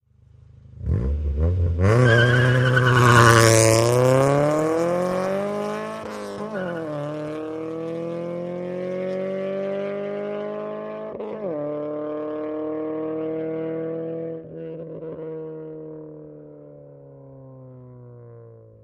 Integra Rev Peel Out By, acura integra ext.

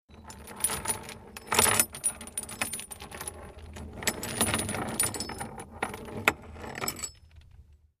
Chains Drag Rattle

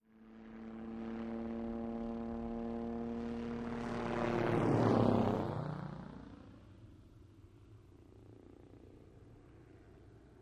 OH6 Loach By Fast Ster; little bird

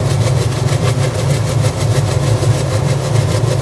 Propeller Mvmt Above, propeller movement

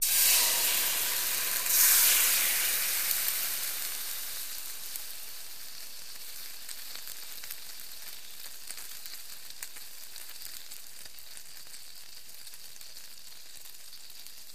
Sizzle Cooking Fryi

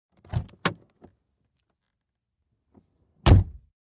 Auto Door, accessories doors

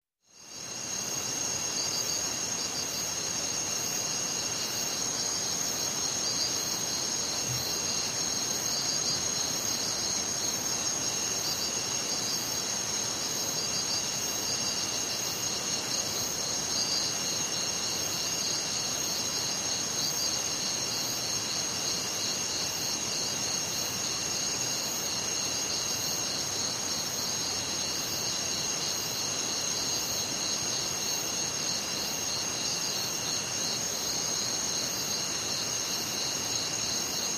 Night Jungle Ambienc, backgrounds

